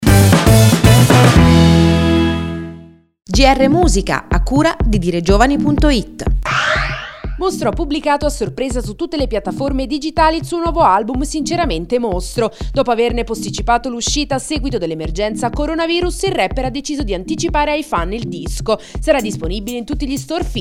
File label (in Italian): musica